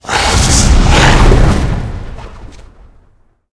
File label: snake attack